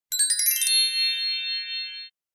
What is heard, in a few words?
cartoon bell; NCH Library